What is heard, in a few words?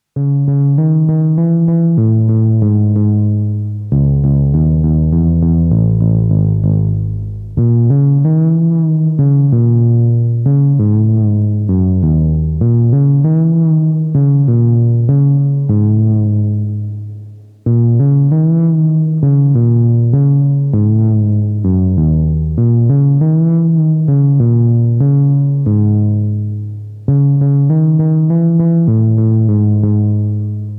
ARP Bass